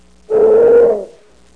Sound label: Bear cub roar